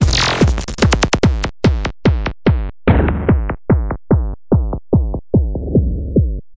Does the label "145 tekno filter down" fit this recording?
Yes